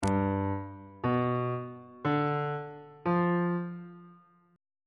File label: a double flat dominant 7th on bass clef; c flat locrian 7th chord 6